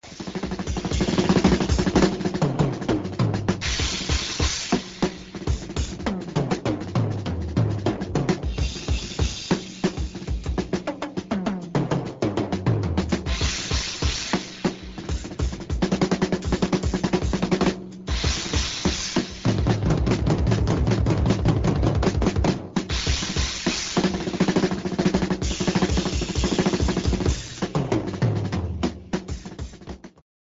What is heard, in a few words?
rhythm